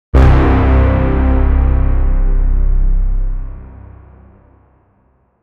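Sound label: very heavy cinematic dramatic, horns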